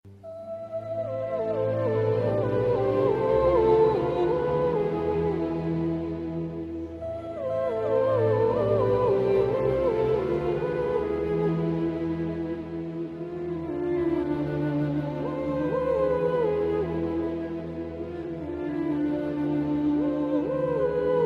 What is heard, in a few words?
Melody Tone